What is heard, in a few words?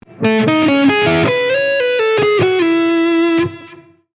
lick, slow, guitar; 4ths lick 2; Here's how it sounds at 1/2 speed.; triad substitution